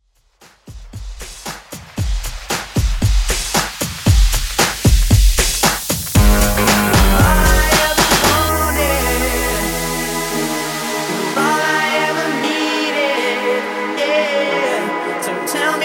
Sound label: Intro 115Bpm